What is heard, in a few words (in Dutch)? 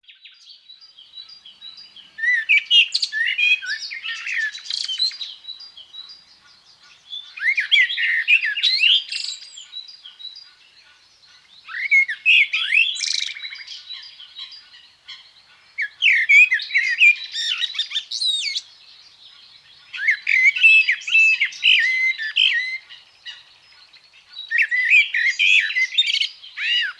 vogels ochtend; GVB